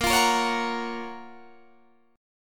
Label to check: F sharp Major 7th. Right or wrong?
Right